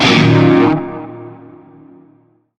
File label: Shawzin Yo Chord C; Warframe; Narmer Shawzin